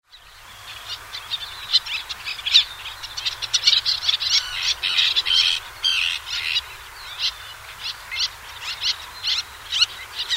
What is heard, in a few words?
little tern